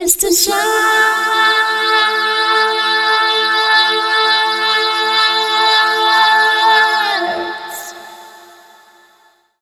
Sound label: VOICE FX, Dance Vocals